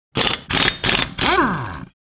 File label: Impact wrench on quick bursts